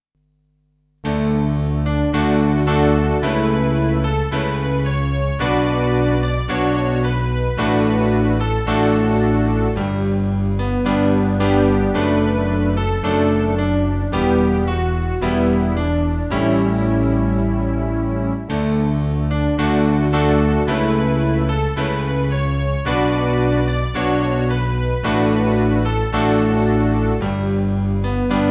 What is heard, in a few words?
d major up; Improvisation